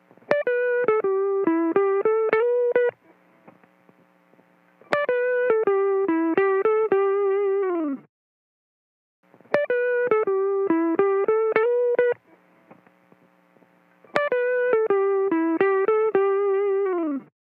Electric Guitar Part B 104 BPM, Dry; Melodics; Cymatics; Eternity Sample Pack; Guitars - Electric

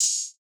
Ultra Wide Open Hihat; trap; Hihats; Cymatics; Trap Starter Pack

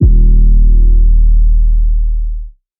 808, D#; Drum One Shots; Cymatics; Light Free Sample Pack; Bonus Samples

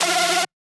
synth screech oneshot F dry; Synth Hits; GhostHack

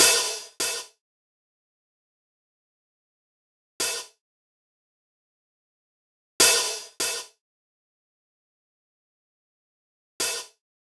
Nostalgia Drum Loop 150 BPM Open Hat; Cymatics - ANALOG EVOLUTION Beta

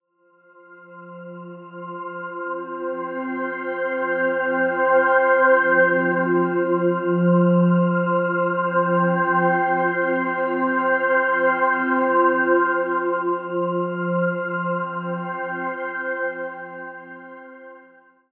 Pad F; One-Shots; Pads; Ghosthack; Color Bass Essentials

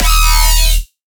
Bass D# Voltage, Dubstep; Bass One Shots; Ghosthack; Dubstep Sound Arsenal

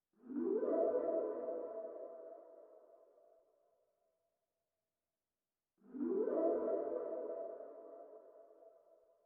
170 BPM E Min Run; Loop Stems; Cymatics; 2022 Melody Collection Platinum Expansion; Touche

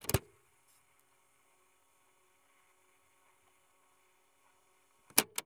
Gear Foley - Cassette Deck Play; FX; Cymatics; Eternity Sample Pack

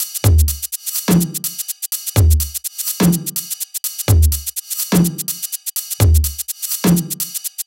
Percussion 125Bpm, techno; Percussion Loops; Swag; Ghosthack Advent Calendar - Day 6 - Techno Kit

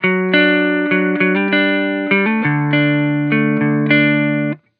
Vibrations Pop Guitar Loop 100 BPM D Maj; Cymatics; Vibrations Guitar Sample Pack

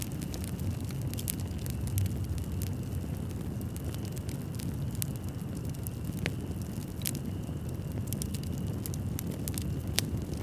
Fire, Noise; Cymatics; Lofi Starter Pack; LIFE

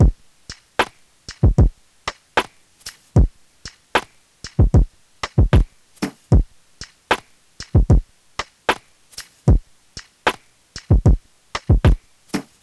Drum Loop, 75 BPM; Drum Loops; Full Drum Loops; Cymatics; Eternity Sample Pack

